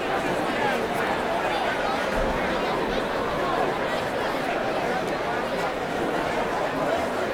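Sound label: Boxing Crowd Small Ambience Loop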